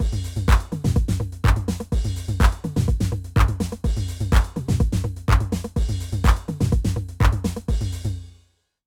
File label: TR-8 dbx on